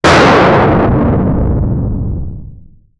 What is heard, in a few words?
nuclear bomb, detonation